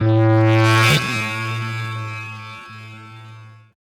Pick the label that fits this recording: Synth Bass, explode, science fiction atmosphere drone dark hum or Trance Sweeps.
Trance Sweeps